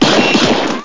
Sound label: it's a gun sample